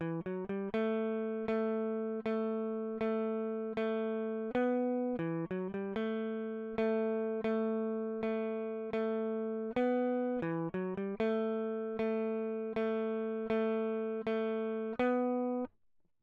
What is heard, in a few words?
electric